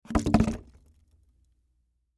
Bottle Water Half, Bottle Water Half Empty Set Down; Cement